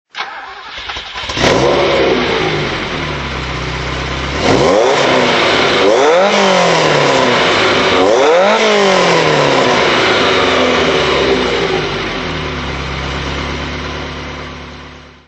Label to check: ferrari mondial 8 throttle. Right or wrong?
Right